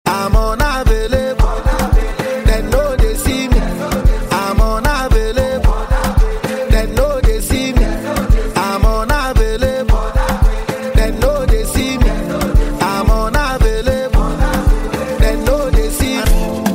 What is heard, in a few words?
Instrumental Hook